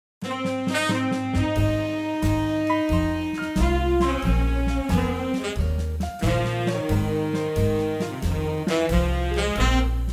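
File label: Ab Big Band, Backing Tracks for Male Vocals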